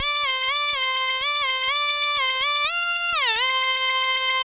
SEQ WASPY; AMG - Now CD-ROM (Roland)

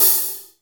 HIHATS, FLNG HALF

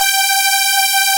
Aud Synth G5; Digital Sound Factory Xtreme Lead

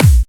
Clubby Kicks, Bassdrums

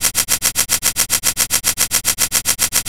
110 PERC, PERC LOOP, Fresh Disco House; USB Soundscan vol.20 - Fresh Disco House I

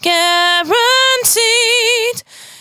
92bpm Bb, Velvet Vocal; Zero-G

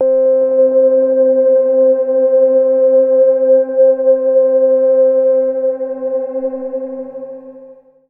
Temple Bell Pad C3, Bright; Trance Formation

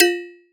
FX JUG BND; ModelCycles

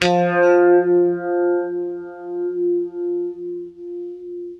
UNIVIB F, Guitar; East West; Steve Stevens Guitar Samples Collection

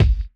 kick, Roland MC-909

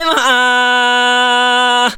VOCAL LINES; Best Service; World Colours

